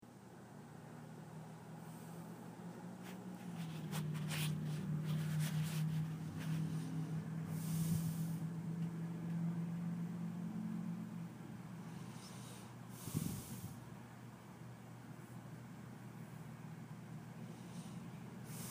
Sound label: Breathing; Emily Lowe Hall room 216